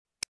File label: step close